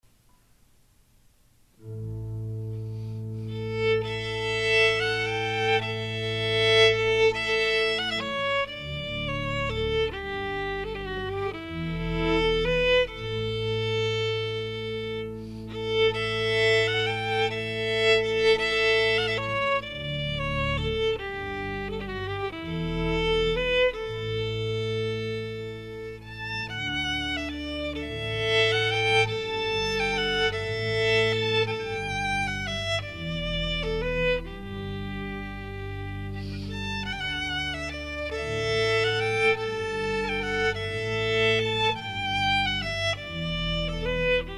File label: violin and organ Traditional Irish; Erin Shore; David Dyson